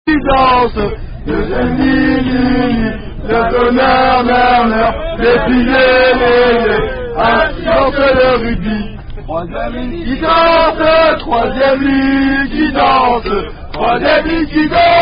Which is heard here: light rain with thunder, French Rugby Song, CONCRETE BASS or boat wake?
French Rugby Song